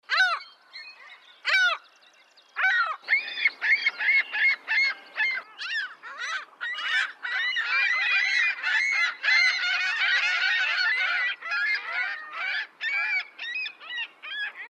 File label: Ringbill Gull; Bird Sounds